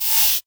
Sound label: Electric dis splash